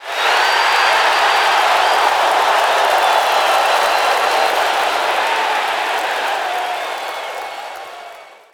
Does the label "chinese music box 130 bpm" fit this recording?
No